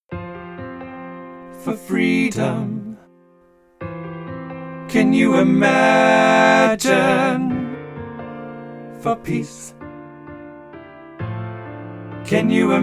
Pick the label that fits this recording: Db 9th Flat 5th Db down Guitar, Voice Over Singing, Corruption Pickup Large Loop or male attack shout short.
Voice Over Singing